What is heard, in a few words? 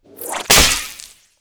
Whip Web Splat; Weapons & Explosions; Sony Pictures Sound Effects Series Volumes 1-10; Sony Creative Software